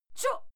female fight scream short

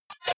hover fast hard; maschinen; signale; soundpool

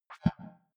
click big bubble, signale

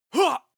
male attack shout short

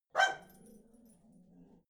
bark snarl angry, hunde; natur-tiere

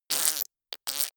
fake fart; koerpergeraeusche

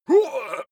male fight moan; schreie; menschen; soundpool

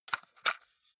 cassette case insert soft; objekte; kassette

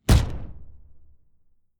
sci-fi shot rustle

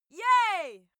female cheer yay; schreie; menschen

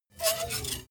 metal screech phaser fast

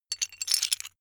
metal pieces clatter short

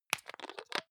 hygiene small screw cup open medium

